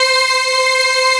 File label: ORG C, organ; Best Service ProSamples vol.10; House; AKAI